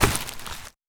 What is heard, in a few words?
Forest